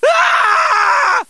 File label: scream; deadpool